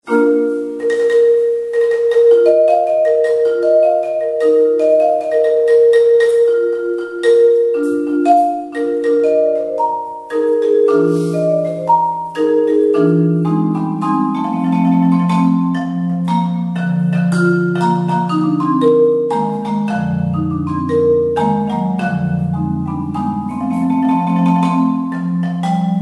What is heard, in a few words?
marimba; Star Wars